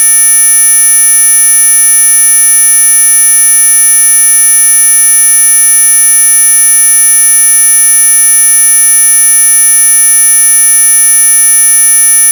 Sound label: 10kHz Boost